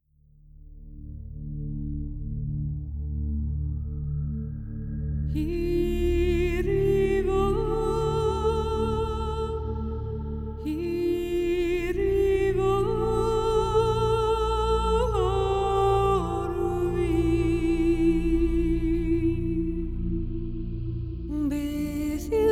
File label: ancient voice acapella